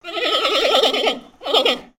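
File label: Pets Bear Panda Ecstatic; creatures; Lego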